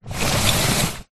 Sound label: card box product small slide table